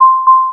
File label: warning immediate; IQ.Pilot; selfdrive